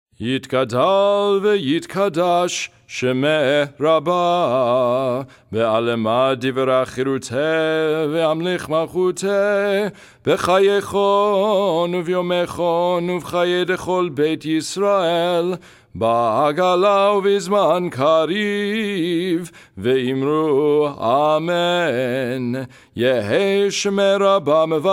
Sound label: hatzi kaddish nusach minor